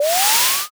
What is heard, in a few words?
wind magic